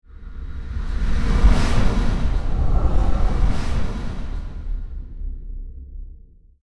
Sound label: Squadron of spacecraft jets fly by